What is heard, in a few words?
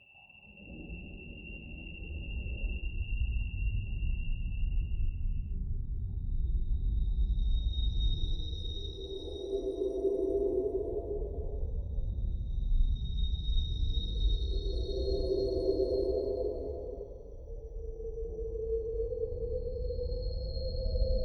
AM on FM Sine Wave, Short Waves, Long Distance; Beepblip; Wave Farm